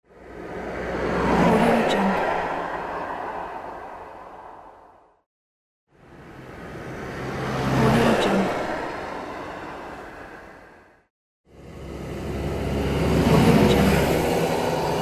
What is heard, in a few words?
car